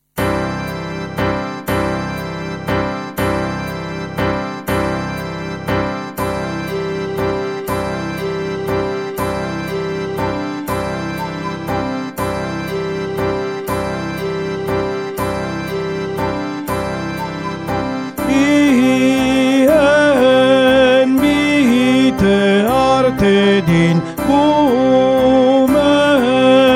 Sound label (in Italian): tenori; Carmina